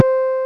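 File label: JV house bass, JV-1080; Syntec; Wall of Sounds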